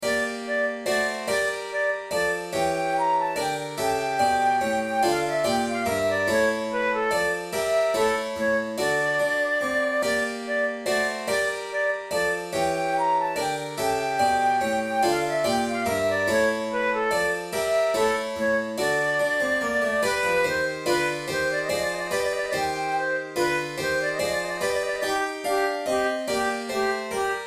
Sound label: tempo di minuetto